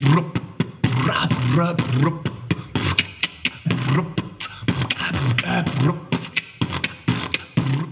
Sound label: beatbox 16 beats